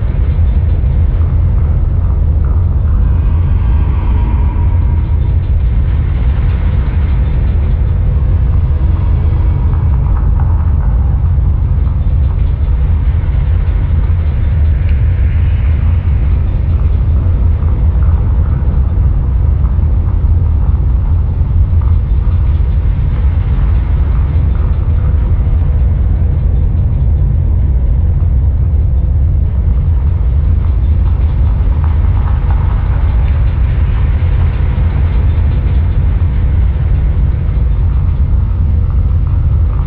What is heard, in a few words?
Revolve Inside Loop; moul